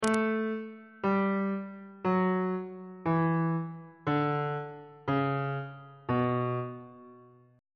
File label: c flat aeolian mode descending on bass clef